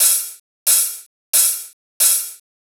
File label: count off open hihat 90bpm